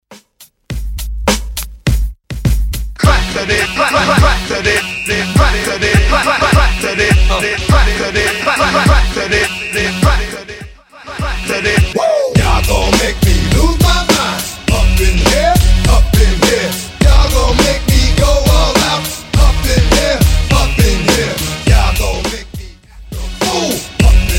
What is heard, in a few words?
Transition Smashup 103 130bpm